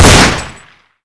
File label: usp unsil 1, weapons; Counter-Strike Pack